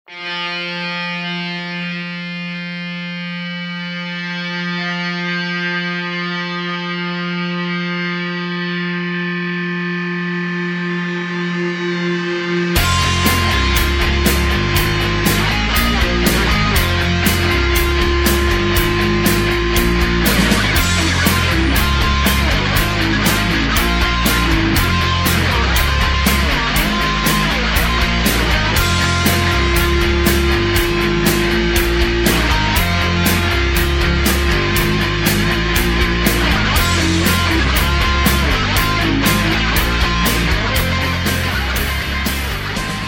F No Backing Vocals, backing tracks

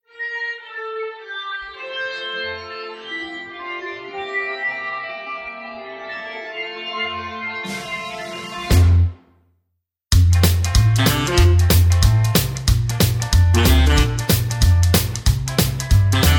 No Backing Vocals

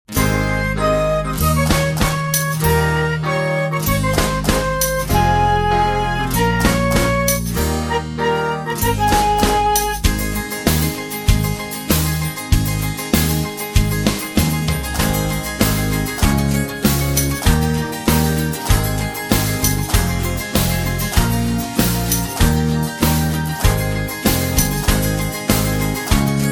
Ab To Bb No Backing Vocals, backing tracks

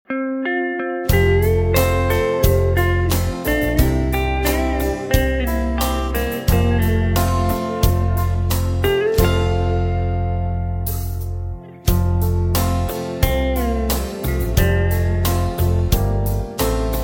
F No Backing Vocals, backing tracks

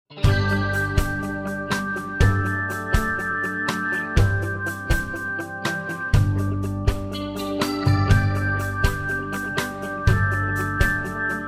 (G) No Backing Vocals

